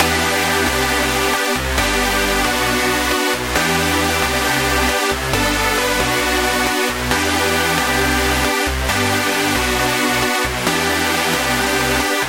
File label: Chord; Routed Sound; Sylenth1 Expansion - Flame Summoner Vol.1